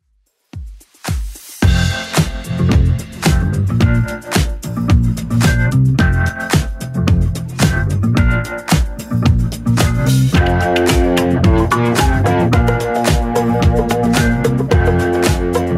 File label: 70s Club Edit 110 bpm